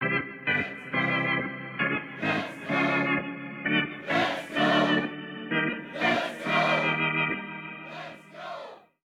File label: organ